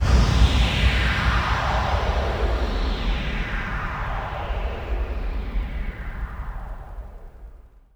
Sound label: Downlifter